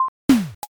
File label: vending